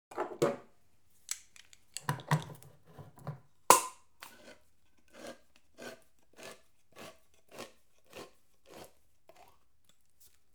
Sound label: Can Opener, Tin Opened, Contents; Household; BBC Sound Effects Library